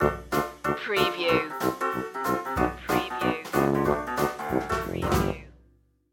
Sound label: wildcard sally stinger